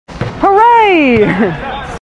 hooray sound effect; made with Voicemod

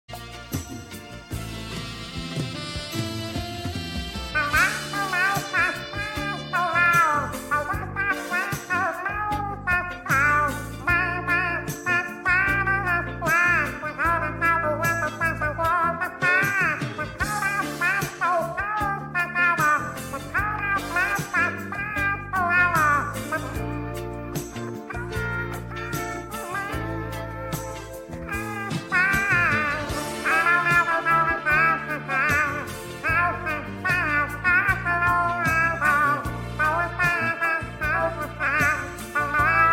meow version

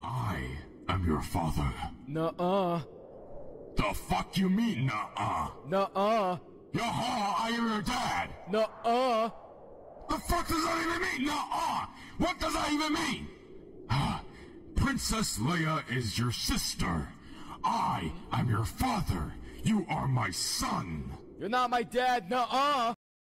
nu uh meme sound effect, beatbox; FNAF

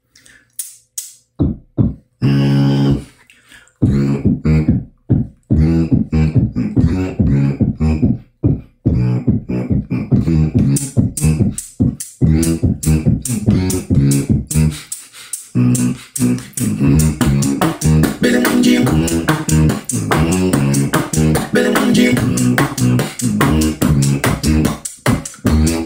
beatbox; meme

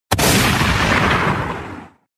Gun Shoot; high quality

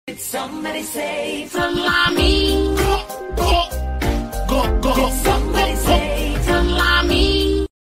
Gulp Gulp

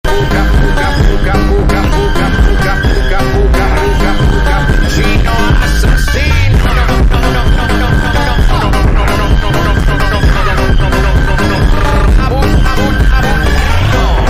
Cappuccino Assassino FUNK; Sprunki; Italian brainrot

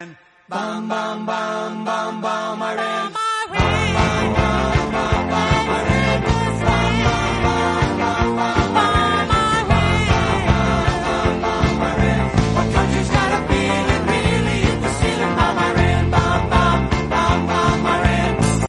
song; Trump

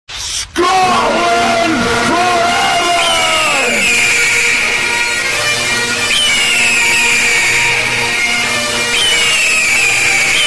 eagle

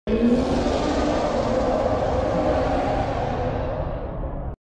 Reaper Leviathan Roar; Subnautica

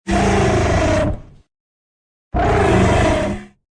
thumper crawler; lethal company; made with Voicemod

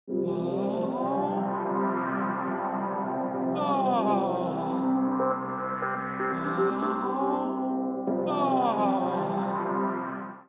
Aaaah Aaaauuh; South Park

